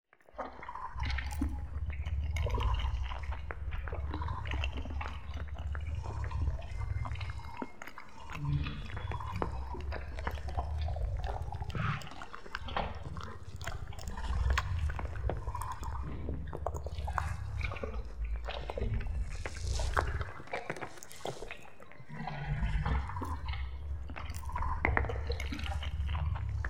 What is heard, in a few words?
aliens eat